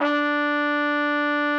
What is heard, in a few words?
D4, TRUMPET S; Xsample VOL-3